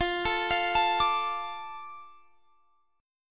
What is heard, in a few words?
F 6th F down Guitar Drop D